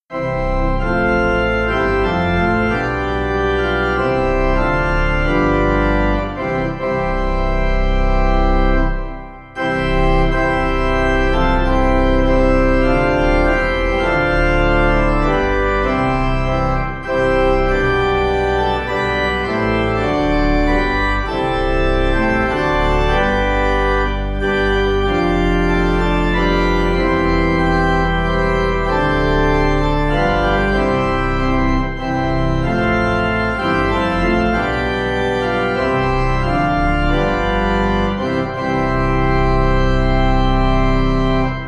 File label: Pipe